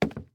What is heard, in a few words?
wood step, Footsteps; Civ